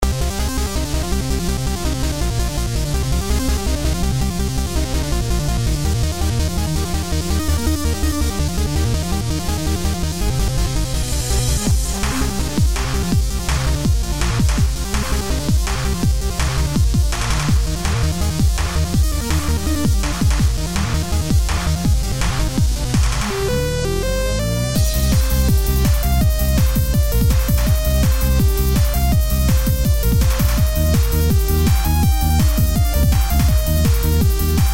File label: Buzztone Symphony